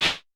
CLAP, Vermona Mono15 Drums

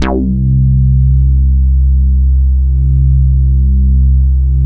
2600 BASSICS, Vintage Keyboards; Big Fish Audio; First Call Vintage Keyboards

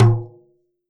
drum, WORLD DRUMS

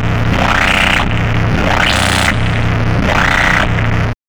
CARTRASH

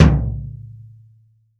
BD, REAL DRUMS, JUNGLE KIT

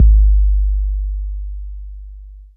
K 808, KICKS TR 9+8

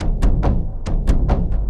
Diji Foom, Drums, Frame, Kendang, Short; Sonic Foundry (Sony Creative Software); Friendly Fire

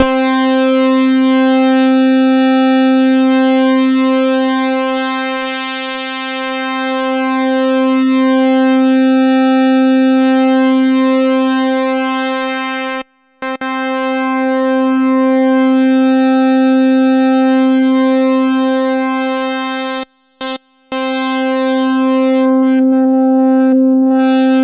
C4, SynthLine; 90 s Sample CDs; NiceBeats; SynthLine VOL-14

